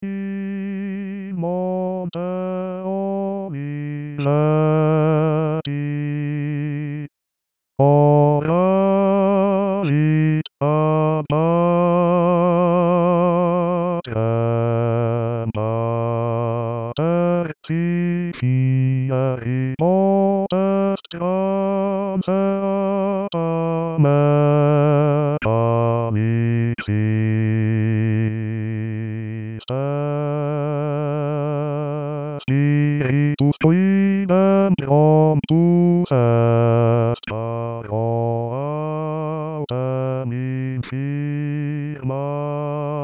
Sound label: basses; In Monte Oliveti